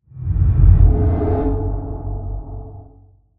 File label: Whoosh Ghostly, Transition; Low, Production Element, Imaging Element, Accent; BlastWave